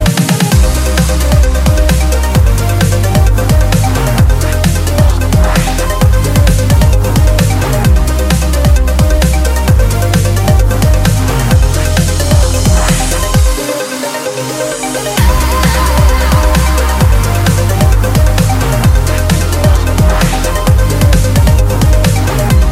Futuristic

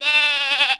Sheep

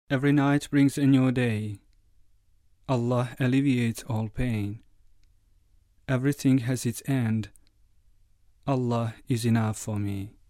Islami Tone

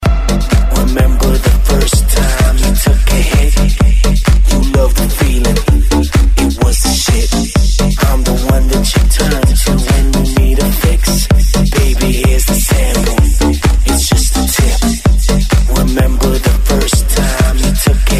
House

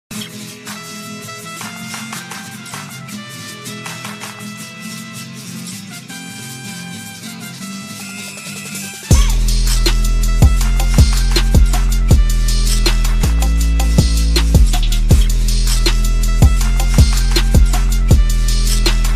Flamenco Trap

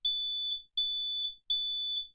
Tone Alert; APX

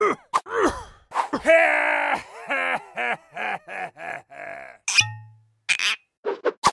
Clown Laugh; DBD

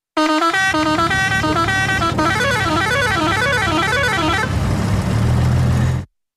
Truck; PSL

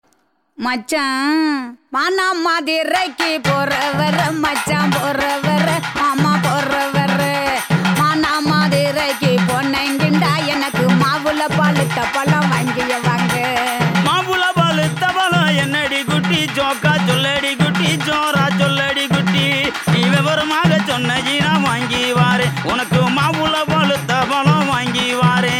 Nice Kuthu Song

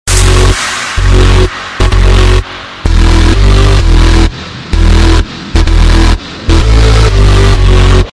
Full Bass Sms

